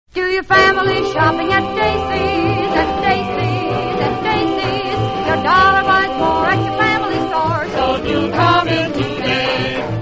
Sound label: radio jingles; dacys; allied radio artists